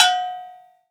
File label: Cowbell; 7rystan's 600+ FREE EDM SAMPLES & DRUMS; Percussion